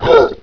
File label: pain; openffa